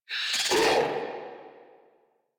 Infested Charger Alert, Enemies; Warframe

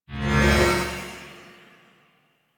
Mag Crush Cast; Warframe

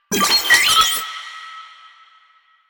Sniper Drone Vocal; Enemies; Drones; Warframe; Lasrian

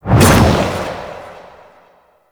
Hover Attack Base; Enemies; Warframe; Warframes; Pax Duviricus

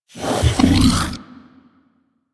Vocal Breathe Fast, critter; Warframe; Hunting Critters; Undazoa

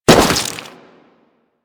Crewman Step Flesh, Footsteps; Warframe; Corpus

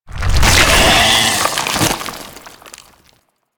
Infested Bone Blade Tentacle Spike End; Warframe

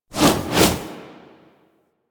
Arm Swipes; Warframe; Sacrifice; Sacrifice Arsenal

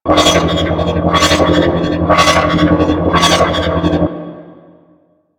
Generator Open Loop; Ambience; Props; Warframe; Tenno; Sector Wars

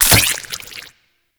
Collar Release; Warframe; Infested Alad V

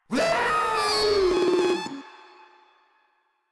Proto Infested Runner Player Spotted; Warframe

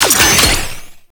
Shields Up Battery Pickup; Tau; Twelve Minute War

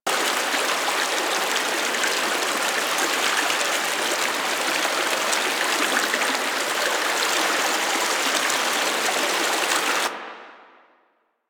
Waterfall Medium Loop; ambience; props; Eidolon; Warframe; Eidolon Plains

